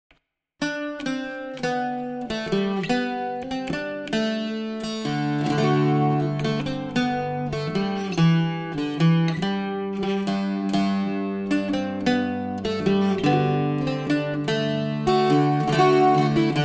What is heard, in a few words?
bouzouki